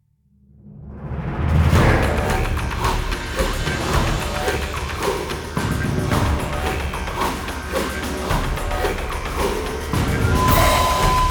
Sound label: Monster Hunting Combat; Skellige